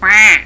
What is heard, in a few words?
QUACK